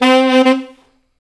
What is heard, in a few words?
SAX A. STAB, Alto Section; Roland L-CD702